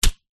warfare slingshot fire

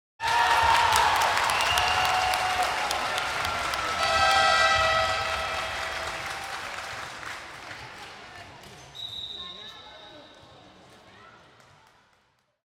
Crowd Shouting, Cheering, Light Clapping; sound ex machina

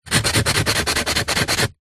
polystyrene strip thin rub scratch

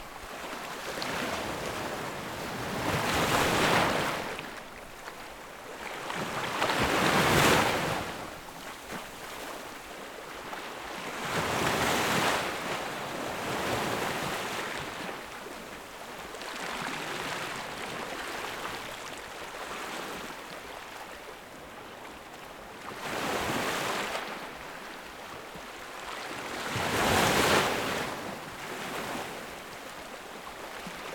Waves crashing on a sandy beach; Sound Ex Machina

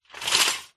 industrial cart metal heavy bash or set down

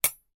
household knives large hit together

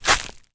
foley footstep bark chippings

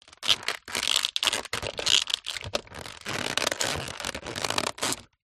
plastic object cheap tacky movement creaks; foley

